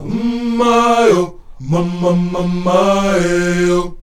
UM MAY, Afro Chants; Roland LCDP11 Africa VOL-1; Um May-O

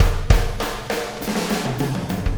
Wormhole Beat, Processed Drumkits

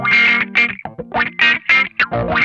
GRAINY WAH, Funk Guitar; Zero G - Funk Guitar

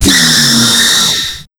TECH 140 FX, Big Beat Drumloops

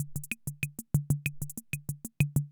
Ace Tone Rhythm Ace Loop, Drum Machines; 1967

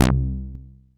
Bass Note C

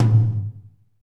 TOM, Roland; Northstar; Drumscapes Roland

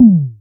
202 tom, Drums, Boss DR-202

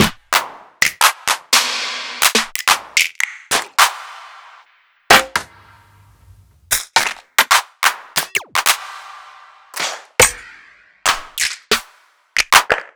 808 trap Claps

